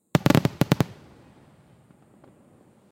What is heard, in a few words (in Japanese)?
花火の音 連射; firework